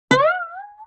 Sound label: Guitar Plink Slide